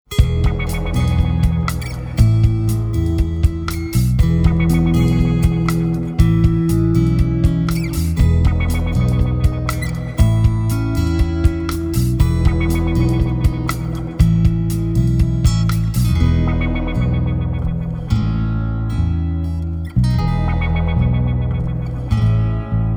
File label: Relaxed